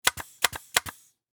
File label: Industry Brad Nailer Electric Shoot Three Times; Power Tool Sound Effects